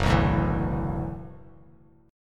F sharp 7th Suspended 4th Sharp 5th, Bass Standard; 2,2,0,9; strummed